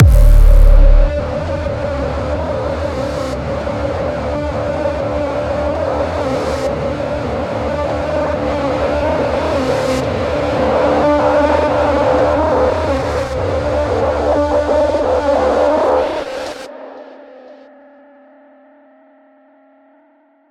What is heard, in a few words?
Main Ambient; Blood Harvester